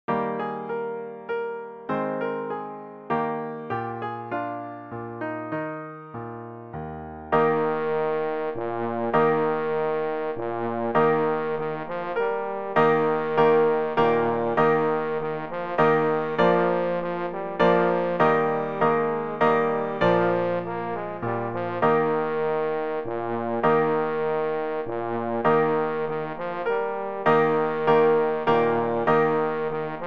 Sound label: trombone baritone and piano; theme from the royal fireworks